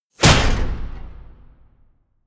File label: wham, whooshes